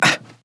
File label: Grunt Hit; Barik